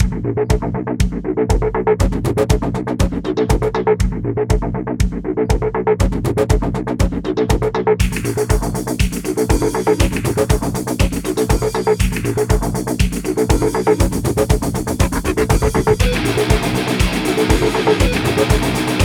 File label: Heavy Riff Looped; Dual Blade: Battle of the Female Ninja